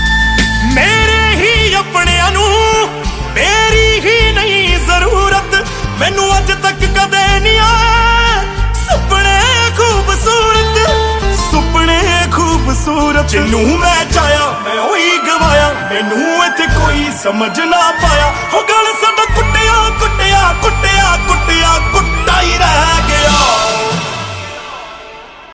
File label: hindi music ringtone, bollywood